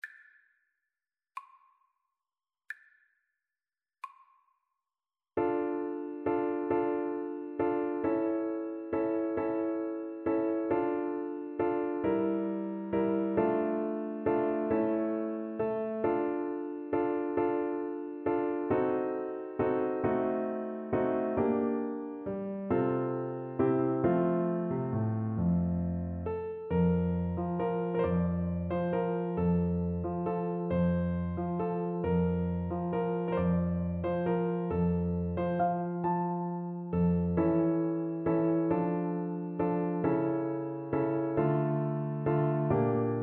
farrenc FL kar, Flute

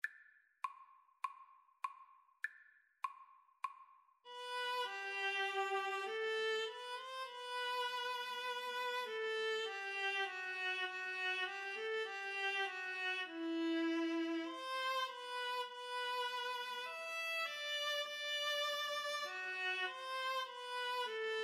aria, Viola Duet

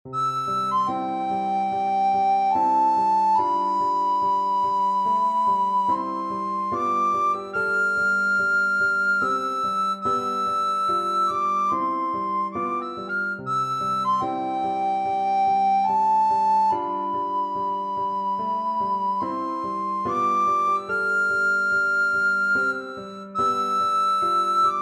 recorder

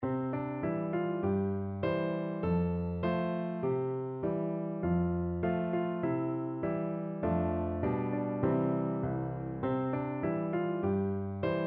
2 piano; teapot

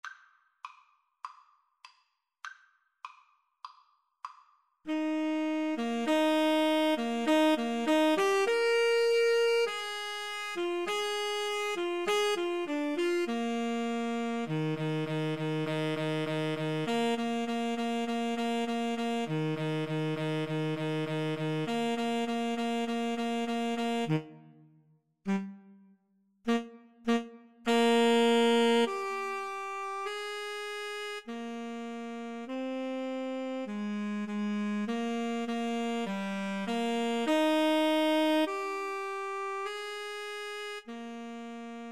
eine kleine Tenor Sax Duet

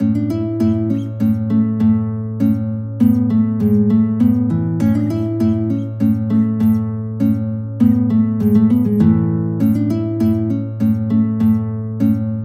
Tambourin, guitar

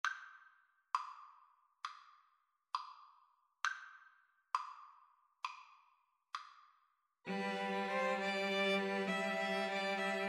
pastorale, String trio

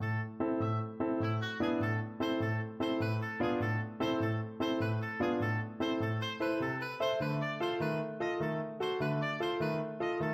dukas sorcerer apprentice, oboe

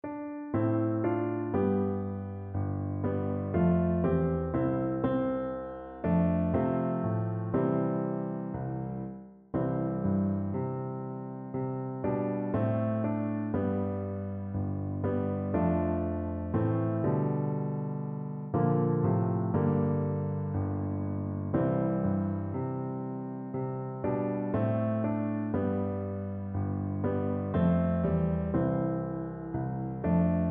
wexford carol BN, Bassoon

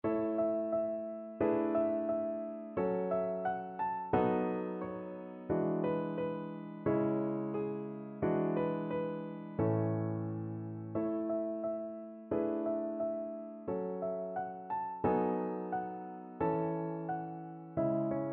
macDowell, piano duet

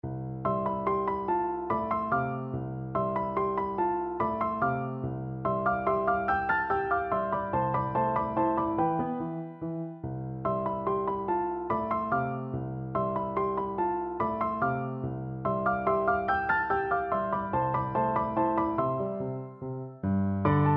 lakme, piano duet

